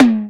Linn Synth Tom A Key, linn synth tom sample tuned to the A note. Loudest frequency: 587Hz; tom drum